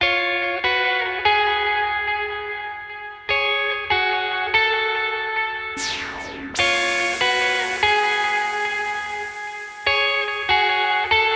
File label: Drums, metal; Liquidize; Fear My Drums